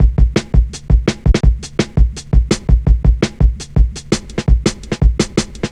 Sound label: Drum Loops, Jungle